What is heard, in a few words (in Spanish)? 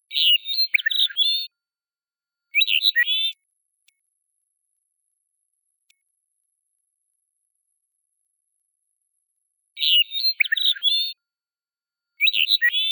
canto; biodiversidad; conservacion de especies amenazadas